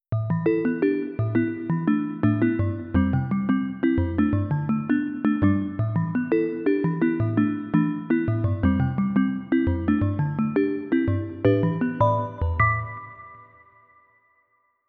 marimba; Wiwi Digital music technology; marimba demo

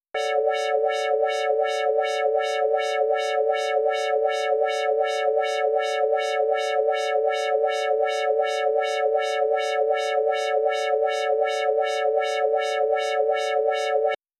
filter; Wiwi Digital music technology

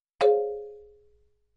marimba Note; Wiwi Digital music technology

